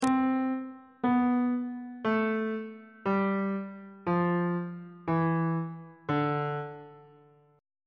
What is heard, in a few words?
dorian mode descending on bass clef